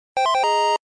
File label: Charged